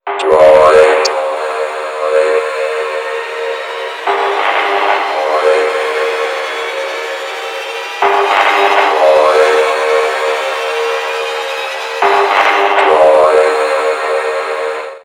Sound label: Siren, ringtones; Motorola Droid Razr M